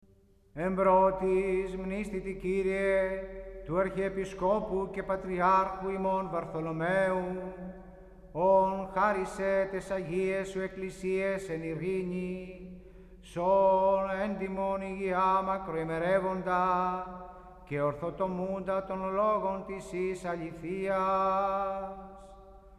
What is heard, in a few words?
ekphonese; Byzantine Liturgy